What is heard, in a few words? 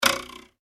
comedy ruler twang; Comedy / Cartoon Ruler Twang; Funny Sounds